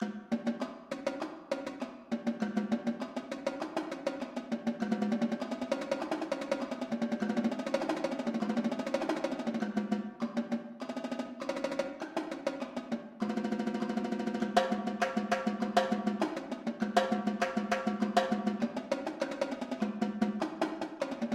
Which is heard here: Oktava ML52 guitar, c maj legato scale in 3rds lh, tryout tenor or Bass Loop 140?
tryout tenor